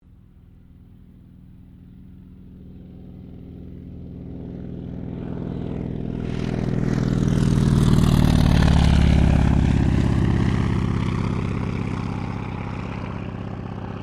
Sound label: AIRCRAFT CAC WIRRAWAY PASS